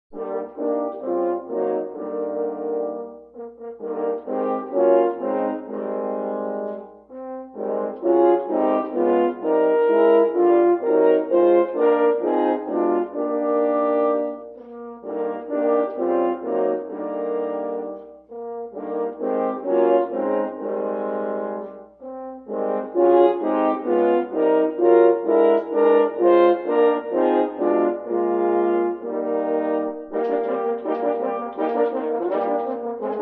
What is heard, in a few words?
bell; jinbell